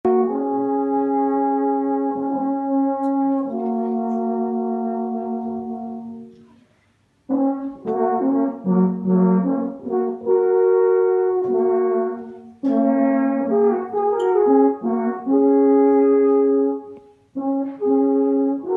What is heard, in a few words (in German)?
alphornklaenge